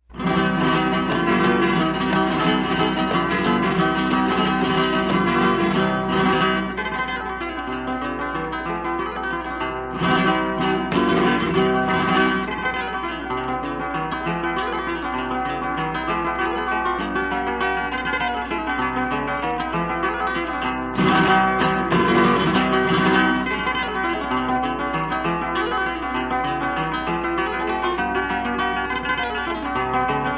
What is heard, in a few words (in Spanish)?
Sevillanas; Sabicas; Mario Escudero